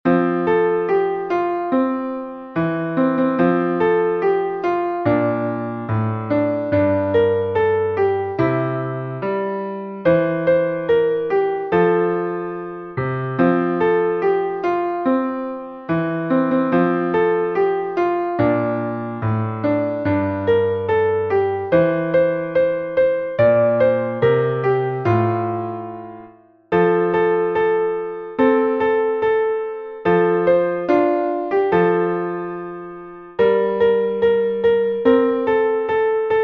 jingle bells; pierpont james

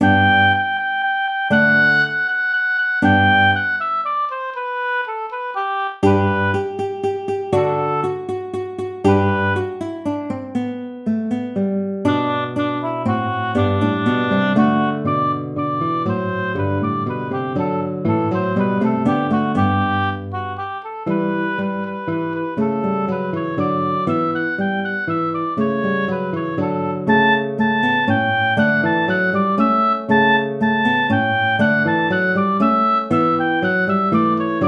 old hungarian dance

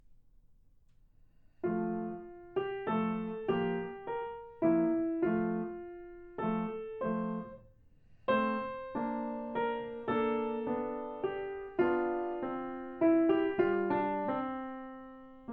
andante easy piano version; haydn joseph

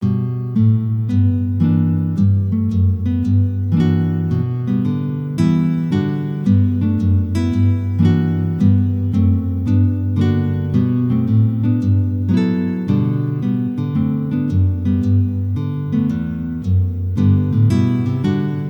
big band melody

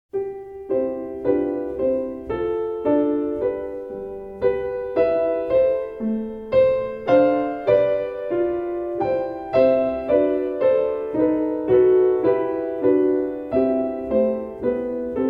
prelude; Chaminade Cecile

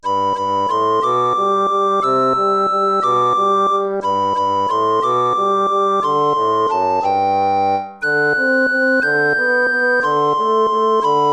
waltz; Carulli Ferdinando

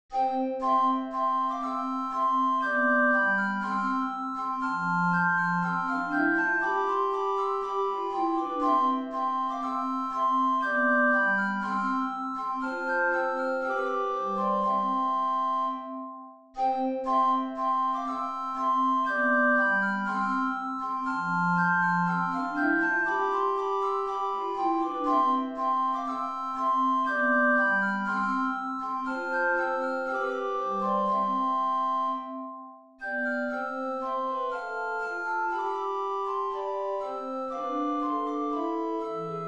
te deum prelude; Marc-Antoine Charpentier

